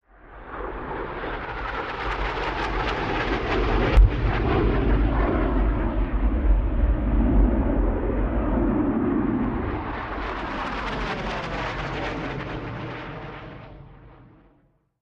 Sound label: AV-8B Harrier jet drops exploding bombs.; Planes, Aircraft Jet, Bomb Engine, Jet